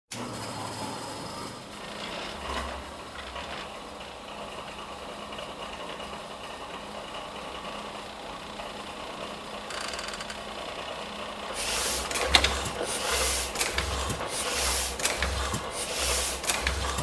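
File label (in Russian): printing press. sounds of machine.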